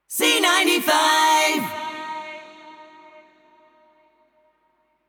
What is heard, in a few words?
Jingle Acapella; C95 Jingle Package